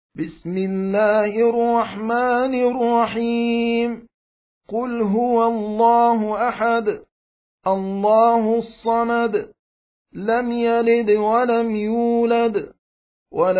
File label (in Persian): ترتیل; سوره اخلاص